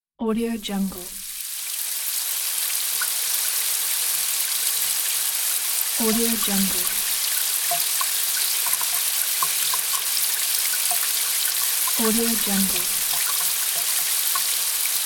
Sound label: rain; wistansound